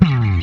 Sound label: Single Acoustic Basses